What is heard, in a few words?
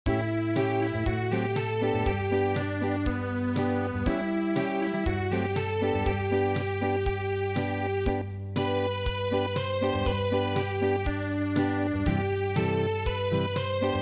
Unplugged; Halialaulani